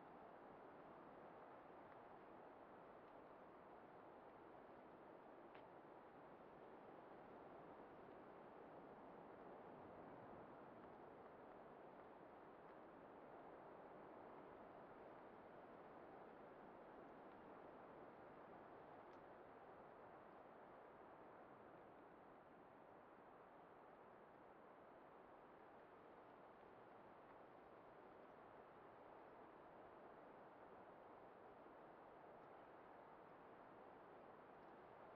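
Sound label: ambience interior closed room; Titanfall 2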